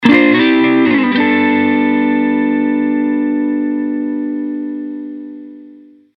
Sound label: Riff